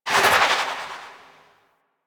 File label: Fairy Bullet Jump; DE; Warframe